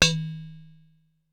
Pot Lid Hit, Ensoniq SQ-R Plus

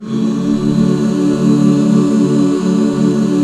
D MAJ, Back Chords; Sound & Vision - Gigapack II CD 1

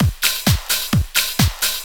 groove, DRUMLOOPS

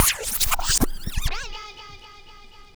SCRATCHES; Scratch 1

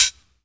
HH, Dance Mega Drums; Best Service; HH-HIP

